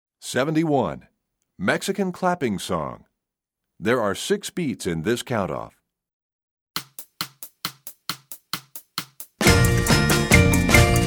Percussion; Mexican Song; EE2000 Book1

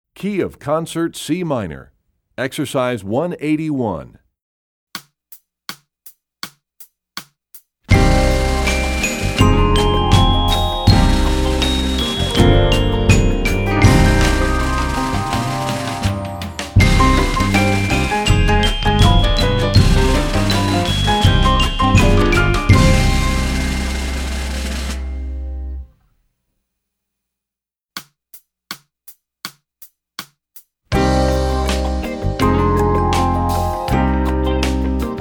Concert C Minor, Percussion; Rubank Studies; EE2000 Book2

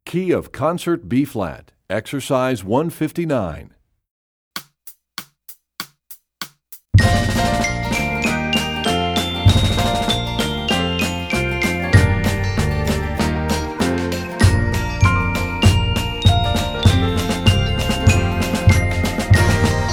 Studies Concert Bb, Percussion; Rubank; EE2000 Book2

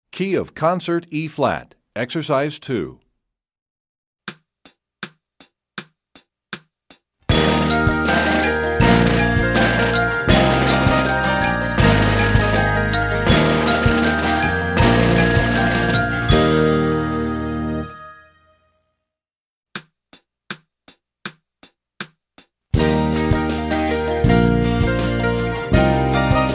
Concert E flat, Percussion; EE2000 Book1

